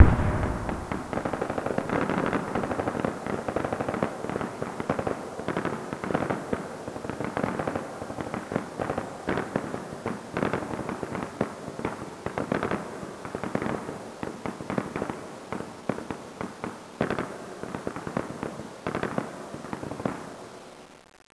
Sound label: distance gunfire